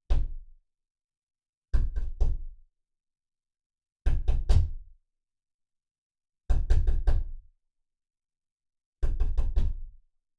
木製ドアどんどん; 3DIO; 色々な動作

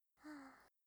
吐息; ループボイス

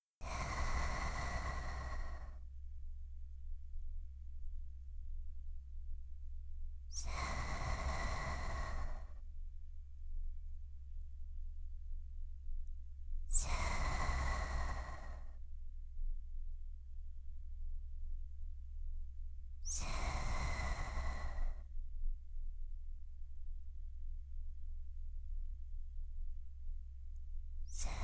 寝息 (寝言入り)

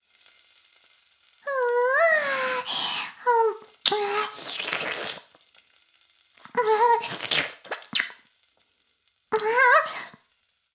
フェラ音声, ASMR; 古き城のドラゴニート2

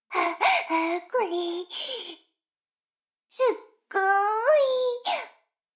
シコシコ音声, ASMR; 逆時間停止されて

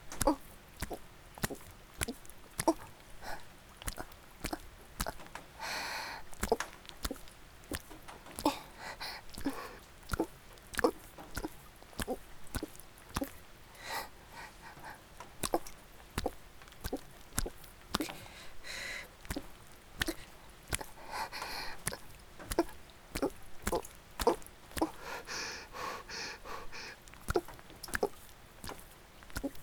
雨降りバージョン ベッドのきしみ音無しバージョン ギシアンループ; 田舎の幼馴染はからかい上手

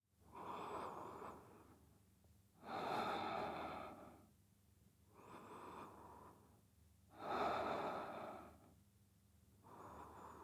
寝息ボイス, ASMR

